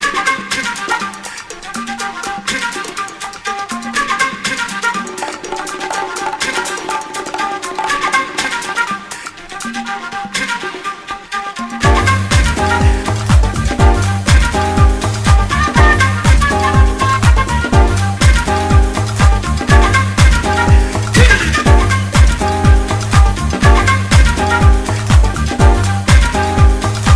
flute; Jazz

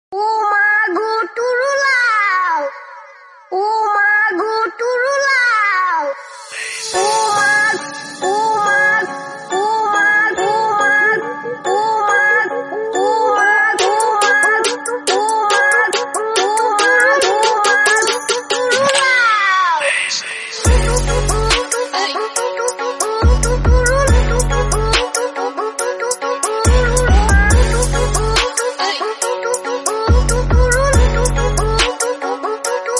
trap remix

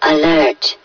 hev suit alert

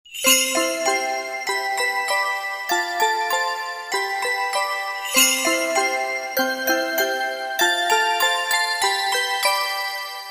christmas ringtone

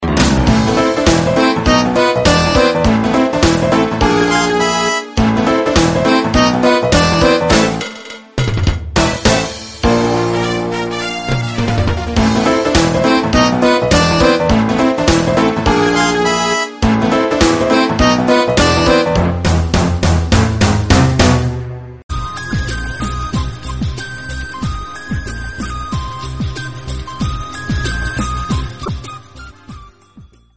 indian ringtone; iPhone

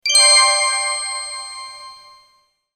magical sms tone

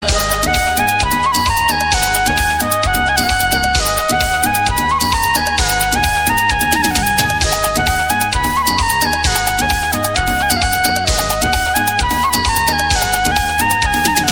flute; Indian

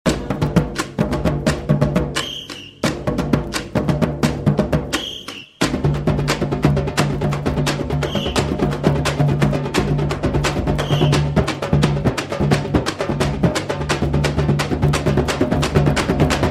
Aayiram Vilakku Beat; Indian

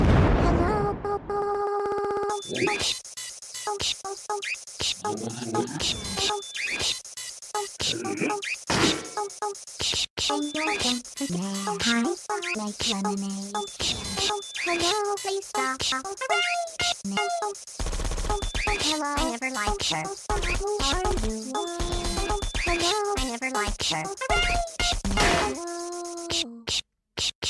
turret beatbox

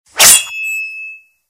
sword swipe

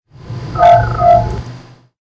oblivion dron

